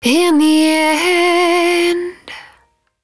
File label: 110bpm Ab, Velvet Vocal; Zero-G